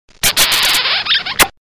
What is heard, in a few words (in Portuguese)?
barulho de beijo